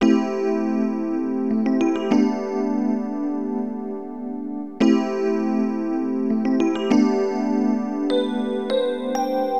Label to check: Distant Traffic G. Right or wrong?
Wrong